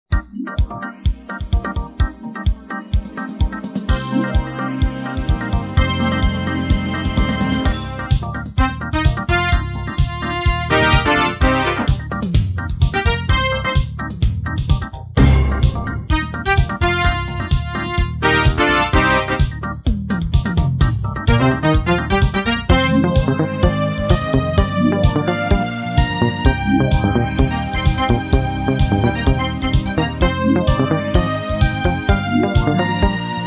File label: Sound Blaster Synthesized Music Diagnostic music